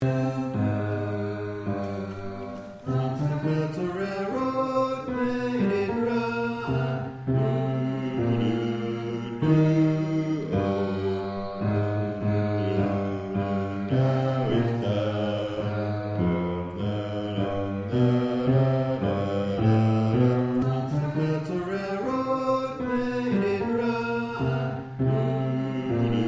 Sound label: Bass, singers